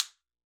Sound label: Steel Drum Sticks B4, Calypso Steel Drum; Percussion